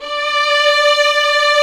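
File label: strings, 8pc; String Master Series; Vlns 2-5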